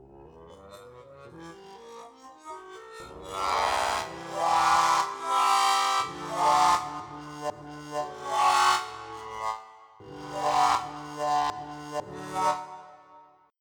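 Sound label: Wah-Growls, trombone; SoundFont - Traditional, Voice & Keys - Volume 2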